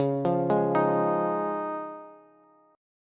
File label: Db Major Add 9th, Bass; arpeggiated; JGuitar